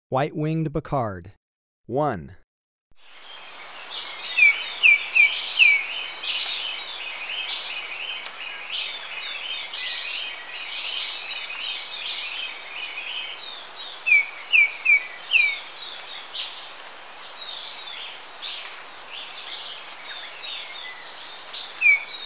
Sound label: White-winged Becard, Birds of Ecuador; S. America